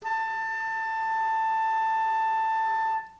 B flat 5 baroque, flute

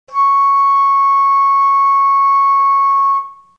D6 baroque, flute

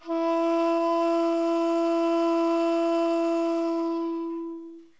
Tsu Chu Meri, shakuhachi; flute; played by Riley Lee

